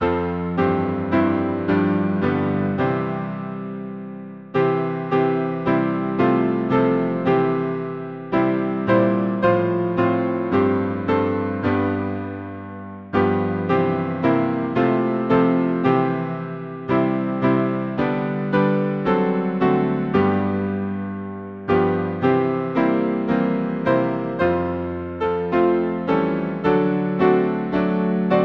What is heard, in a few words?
piano, Lancashire; Lead on O King eternal